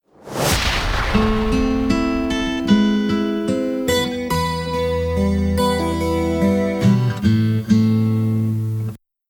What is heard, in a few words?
rock bumper smoooth